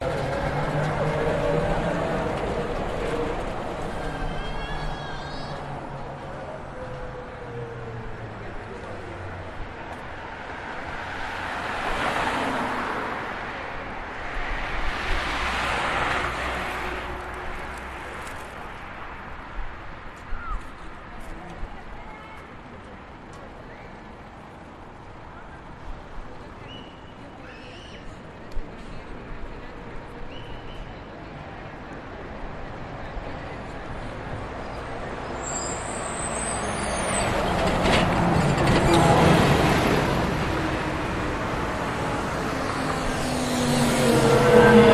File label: Traffic Sound Cars Busses Brakes Motorsounds Walla People In Distance; Budapest Hungary